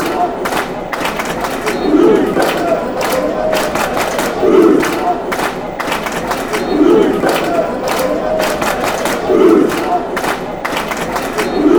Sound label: clap, chants; blues; bcfc